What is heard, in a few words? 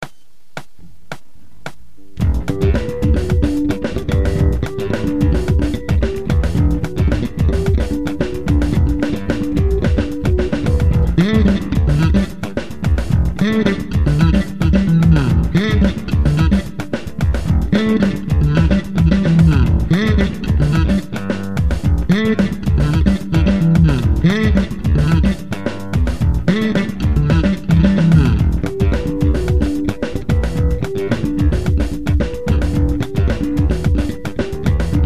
Jazz